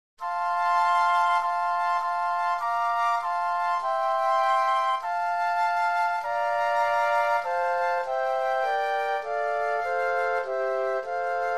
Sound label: Flute Trio; christmas